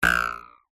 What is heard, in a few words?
cartoon ruler twang metal